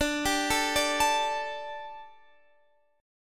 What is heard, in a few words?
D Suspended 4th, Guitar, Standard; Dsus4; arpeggiated; 22,22,19,19,22,x; down; capo=9